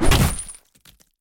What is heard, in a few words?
Chain Bat Hit; Scrap Weapons; Zomboid